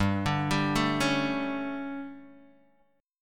D Major 7th Suspended 4th, guitar chord

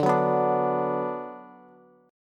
D 6th D down Bass, chord; D6 strummed; JGuitar

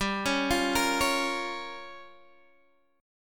G Minor 7th Flat 5th, Guitar, Standard; arpeggiated; 15,16,15,15,14,x; capo=14

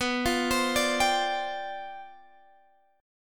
G 11th B

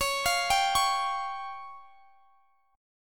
Db Major Flat 5th; arpeggiated

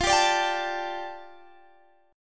G Suspended 2nd F, Guitar; G2/F strummed

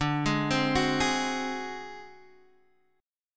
D 7th Flat 5th D down Guitar Standard, chord; D7b5 arpeggiated; JGuitar

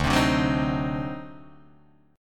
D 7th Flat 9th D down Guitar Open D

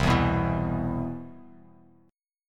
C Major 7th, Bass Standard; CM7 strummed; JGuitar

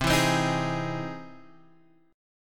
E Augmented Major 7th C

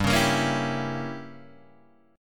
G Diminished 7th, Guitar, Open G

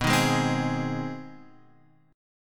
Ab Major B; strummed; Ab/B; JGuitar

